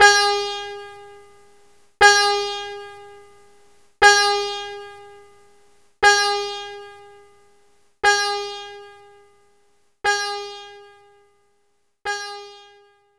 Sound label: Sitar G4